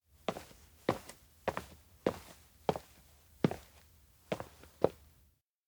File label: steps indoor workshop to plane; aino; anemoi